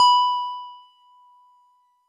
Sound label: Wood Piano DX100 B5